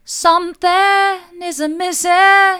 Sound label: something is missing mid, vocal